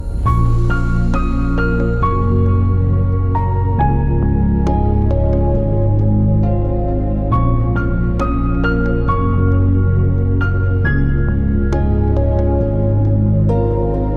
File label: Romantic Ringtone